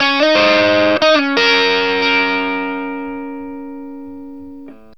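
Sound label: BLUESY E flat 60, Vintage Blues Guitar; USB Soundscan vol.22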